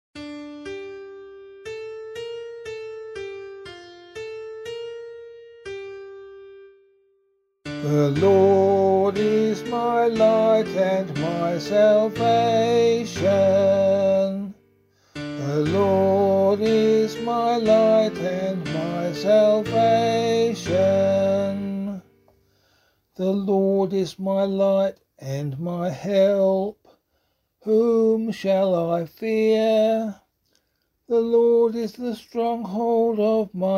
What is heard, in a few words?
Psalm vocal